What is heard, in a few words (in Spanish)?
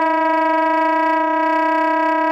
Tremolos